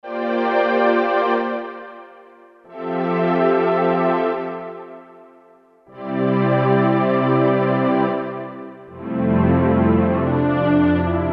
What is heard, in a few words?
Pad Strings, Orchestra; Korg MSC-4S Orchestra 1